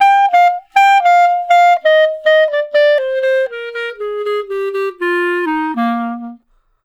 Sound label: Clarinet Solo